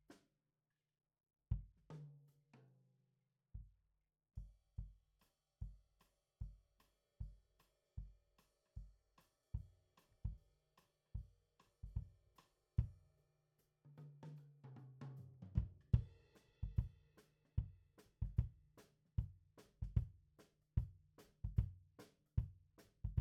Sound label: Kick out, Ragtime